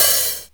open hi hat one shot g sharp key; open hat samples

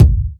original kick drum single shot g key

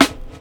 raw snare drum sample c sharp key

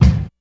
loud kick single shot c sharp key, kick drum

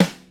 rock snare drum sound f key; snare-drum-samples

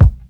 perfect kick sound a sharp key; kick drum samples

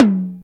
mid tom drum sound f sharp key; tom drum samples

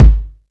small reverb bass drum single hit g sharp key

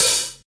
open hi hat sound g sharp key; open hat samples

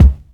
dry kick one shot c sharp key

